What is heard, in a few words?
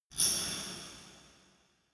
dark resonator hit single soft